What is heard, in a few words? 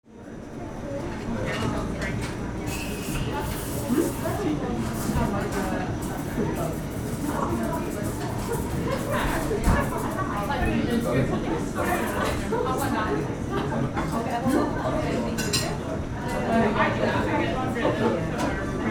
Cafe ambience small cafe on the street